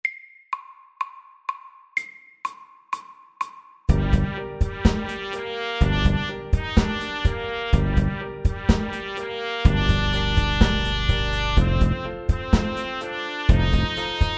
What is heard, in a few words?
G minor rap medium 125